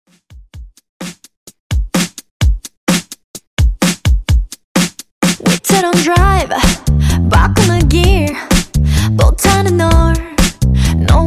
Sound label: 128 BPM